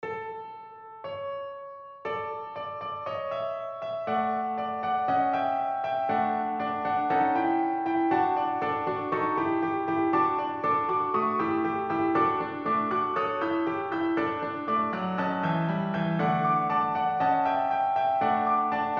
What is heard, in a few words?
piano; W.A.Mozart; 'Wo der perlende Wein im Glase blinkt' (Canon) KV.347